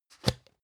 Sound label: plastic can small lid open hard slow